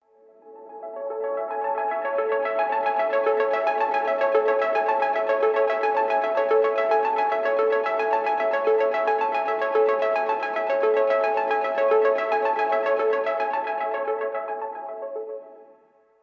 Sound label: Galactic Space Journey